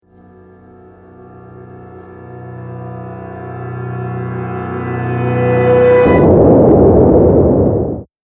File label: Logo Intro Sound Effect; Sonic Logo Sound Effects